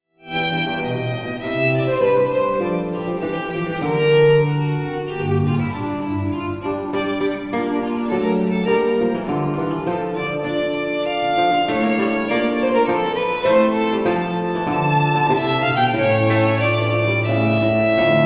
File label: sonata basso e violino; biagio marini